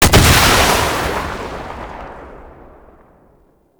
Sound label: Rocket Launcher Shot; Warframe; Tenno; Lasrian